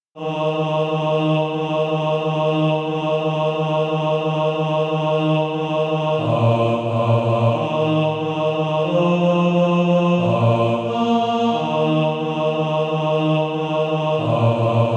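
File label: bass, Practise Music; Crystal Songs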